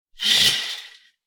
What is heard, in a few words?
open a curtain; theater